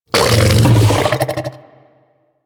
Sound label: Vocal Burp Attack; Warframe; Hunting Critters; Undazoa